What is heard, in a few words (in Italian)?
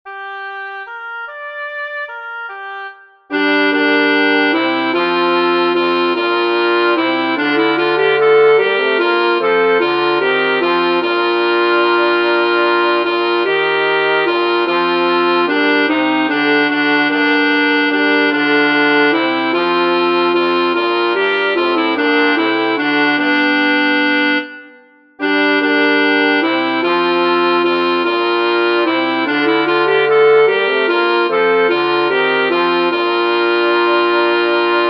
contralti; puer natus est praetorius; progetto voci ditalia